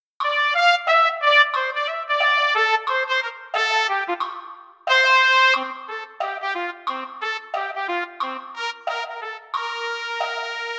trumpet; holiday brass

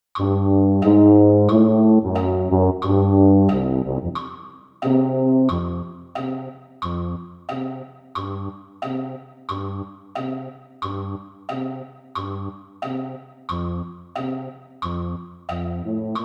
tuba; holiday brass

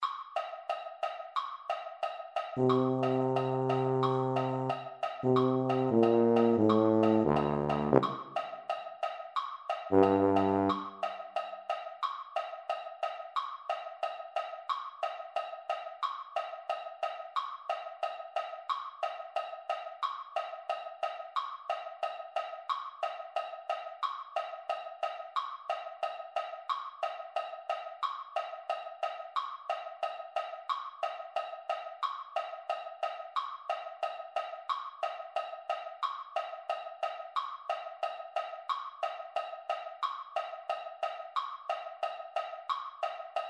jingle bells tuba; holiday brass

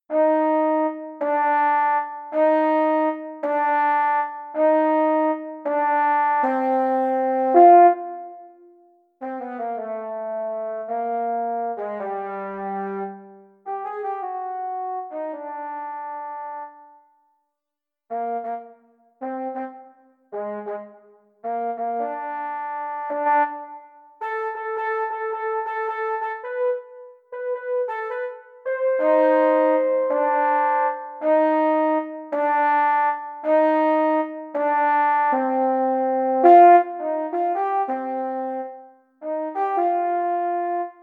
mellophones; holiday brass

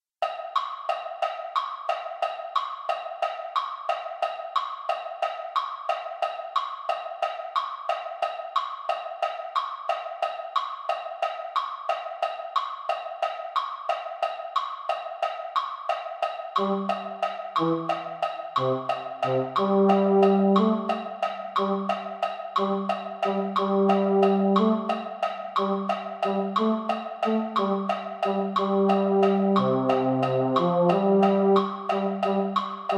wassail baritones; holiday brass

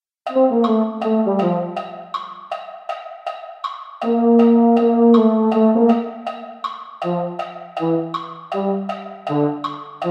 baritones; holiday brass

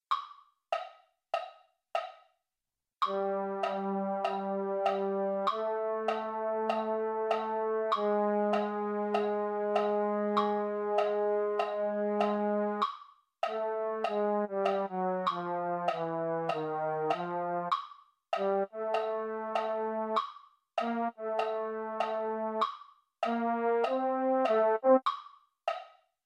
baritone, MIDI; mini corps

